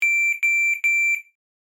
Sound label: Appliance button press tone; Home and Household